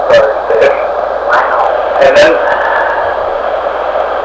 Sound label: Exhale